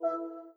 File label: Good Hit